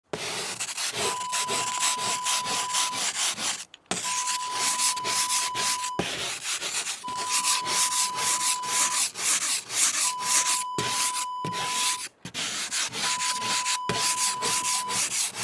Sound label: scraping ice